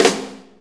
4-4 Snare Flam, Drum Loops

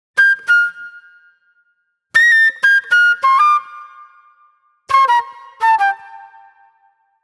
Acid Jazz Flute; Sonic Foundry (Sony Creative Software); Manhattan Flutes; Smooth Groove

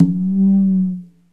CONGA SET; OMI - Universe of Sounds

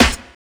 SD, Drum & Bass, 145 BPM; Ueberschall; Drum & Bass Flash Trackz

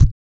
WOOF DOWN, Vocal Blues Soul; Spectrasonics; Planet

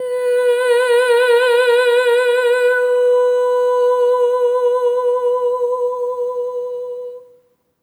EH OH B4, SOP; Spectrasonics; Symphony of Voices

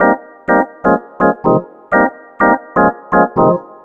Organ chops (125BPM), retro house

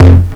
techno, percussion techno; Ueberschall; Techno Trance Essentials; DRUMS

